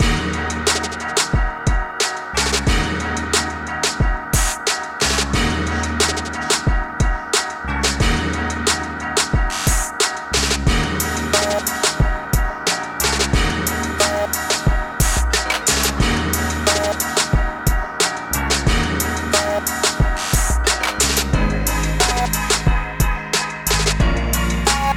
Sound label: hh classic